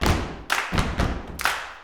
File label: CLAP FEET; Spectrasonics; Vocal Planet; Blues Soul